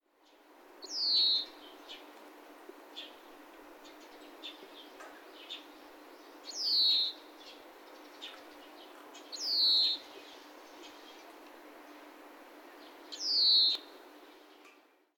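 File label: kawarahiwa, Oriental greenfinch; urawatokubetushien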